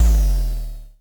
EBASS FX, Dual Percussion Synthesiser; Klone Dual-Percussion-Synthesiser

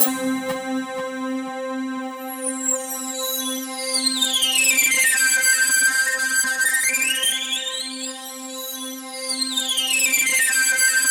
C4, SynthLine; 90 s Sample CDs; NiceBeats; SynthLine VOL-2

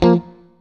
STRAT, GUITARS; Synclavier Sampler Library

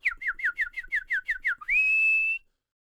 WHISTL; Spectrasonics Vocal Planet; Jazz FX

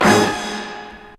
tuners

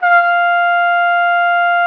F5, TRUMPET; Xsample VOL-3

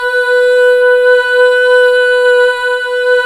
Female Ooz; 90s Sample CDs; Club-50 - Foundations Roland

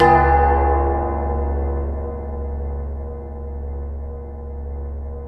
space bass B; InVision Interactive; Lightware VOL-1; Instruments & Percussions; DEMBY

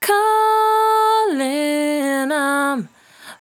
68bpm D, Velvet Vocal; Zero-G

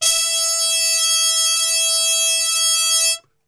E 3, Brass Super Section, TRP HARMUTE; Best Service; 90 s Sample CDs

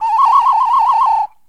BIRD, bird call; Greytsounds VOL-1; BIRD CALL FX

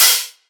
Hihat Open; Antidote Advent; Infected Track Drums

